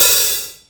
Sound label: HH OP, Drum Dry; East Collexion; Drum 1